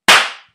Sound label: slap; svencoop; sank